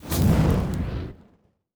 Weapon Shoot (Flamethrower); Sci-Fi Sounds

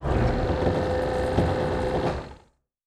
servo big, sci-fi sounds, mechanical

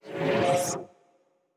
Teleport, Sci-Fi Sounds

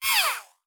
servo small, sci-fi sounds, mechanical

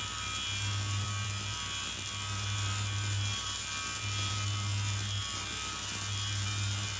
Weapon Loop (Laser); Sci-Fi Sounds

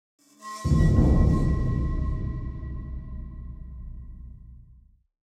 Action Transition, Classic Horror Sci-Fi Music; Dead Ark

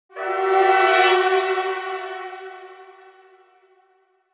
Action Transition, Classic Horror Sci-Fi Music; Dead Ark

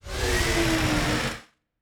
Door, Sci-Fi Sounds

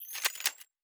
Weapon Reload

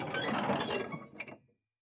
engine stop, sci-fi sounds, mechanical

